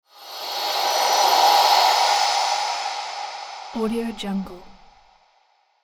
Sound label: magic whoosh